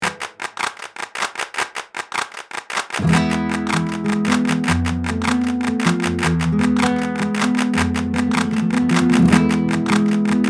Classic Guitar Spanish

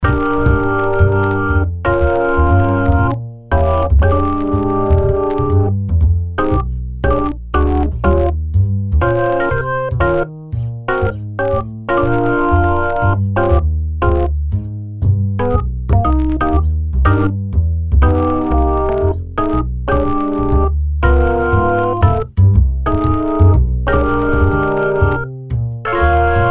Hammond; Vinyl Hammond for Kontakt 2; Pettinhouse

